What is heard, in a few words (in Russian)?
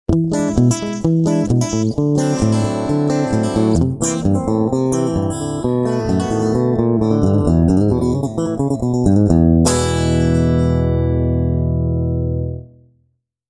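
akusticheskaya gitara, acoustic guitar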